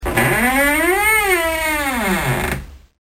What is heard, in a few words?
Door Squeak